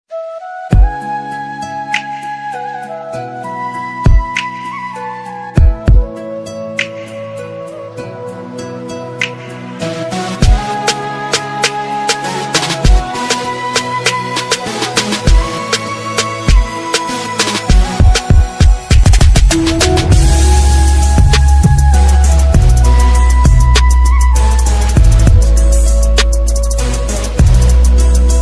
Romantic Ringtone Instrumental Ringtone English Music Ringtone Love Ringtone